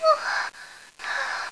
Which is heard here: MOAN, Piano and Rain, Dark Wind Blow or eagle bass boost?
MOAN